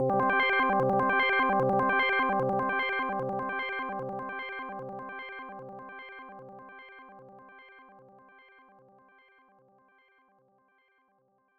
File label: Bonus Slots Slow; magical hat no buttobi turbo daibouken; genesis